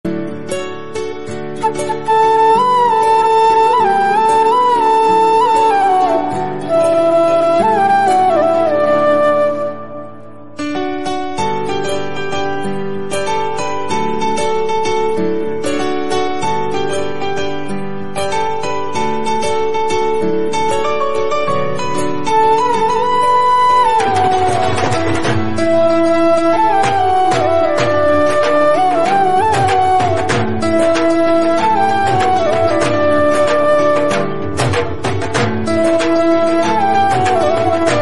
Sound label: instrumental ringtone bollywood ringtone flute ringtone